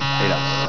wrong; france

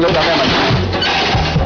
unlock; hongkong

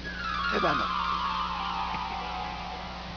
key drop; france

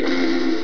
card; usa

